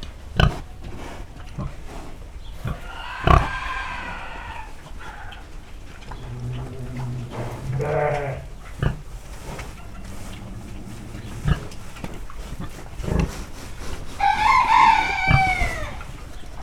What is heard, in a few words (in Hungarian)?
dörgölődző disznó birka kakas, istálló; állathangok; paraszt udvar